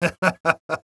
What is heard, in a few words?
LAUGH; BattleSNDS